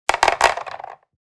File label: Chess pieces drop on chessboard; leisure